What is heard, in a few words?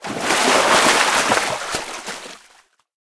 fishing catch